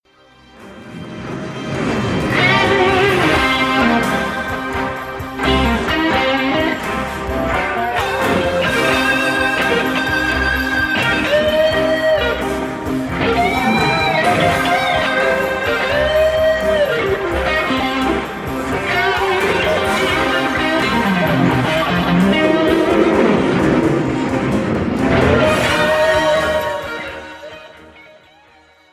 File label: solo Isolated; Venice 1989